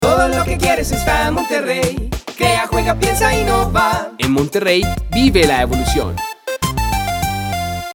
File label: JINGLE; MONTERREY